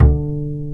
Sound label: UPRIT HM D3, WOOD BASS